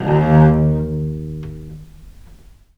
D#1, Historical Instruments, VIOLE MUT; USB Soundscan vol.23; AKAI

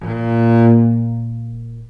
A#1, Historical Instruments, VIOLE MUT; USB Soundscan vol.23; AKAI

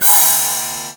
PIATTI; Akai MPC2500

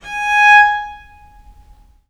G#4, Historical Instruments, VIOLE MUT; USB Soundscan vol.23; AKAI

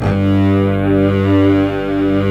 SYN Elicotero; Best Service Gigapack 1; SYN DIG2

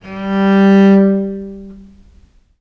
G2, Historical Instruments, VIOLE MUT; USB Soundscan vol.23; AKAI

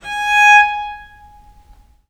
G#4, Historical Instruments, VIOLE MUT; USB Soundscan vol.23; AKAI

